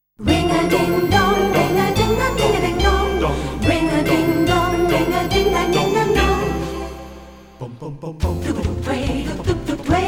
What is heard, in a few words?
Ding a Dong